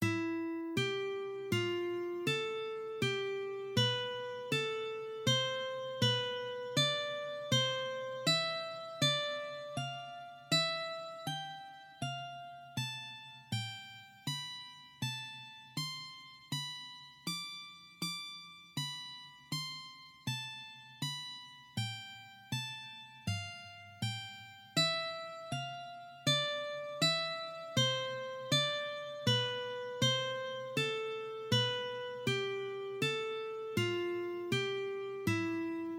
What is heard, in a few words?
Horizontal Ladder Exercise, guitar